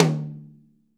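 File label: mid tom rimshot; 90s sample CD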